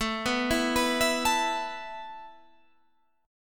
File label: A Suspended 2nd A Guitar Standard; Asus2 arpeggiated